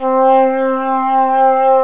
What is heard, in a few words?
bass flute